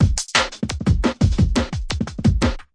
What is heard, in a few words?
echo trans, drum loops and breaks, 174 bpm; 161 to 180 bpm